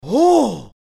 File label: victory